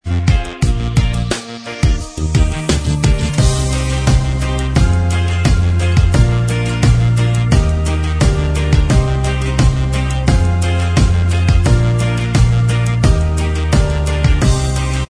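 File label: instrumental, backing tracks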